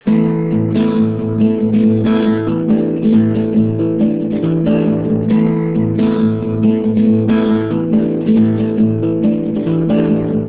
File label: guitar alone